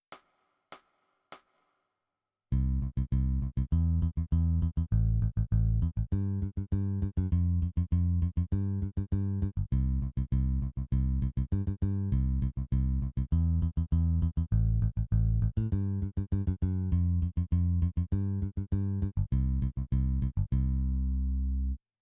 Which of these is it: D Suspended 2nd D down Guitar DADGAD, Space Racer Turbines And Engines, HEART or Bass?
Bass